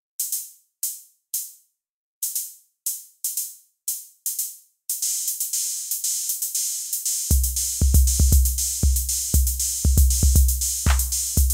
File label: tr drums, Drum Machine; roland dj-202